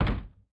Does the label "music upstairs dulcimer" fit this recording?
No